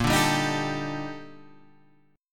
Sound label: Eb Major Sharp 11th Bb